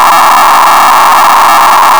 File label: mod, dpsk modem